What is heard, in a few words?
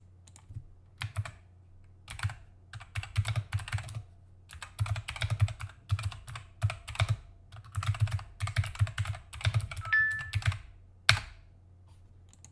rapoo v530